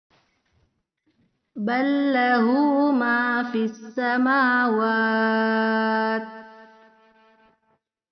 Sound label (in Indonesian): Perekaman baru Karaoke denoised